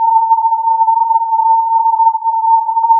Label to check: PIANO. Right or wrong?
Wrong